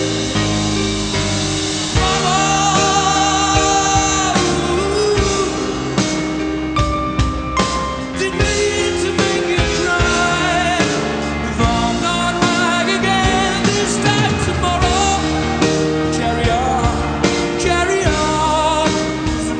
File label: rock